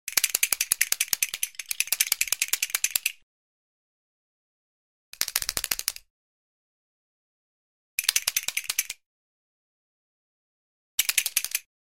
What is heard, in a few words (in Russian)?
zvuk skeleta